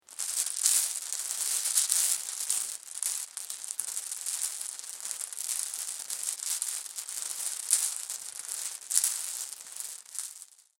Marbles Rattle Long, Many marbles being moved about; long continous rattling and clinking of marbles or small pebbles; Miscellaneous Toy Sound Effects; LynneMusic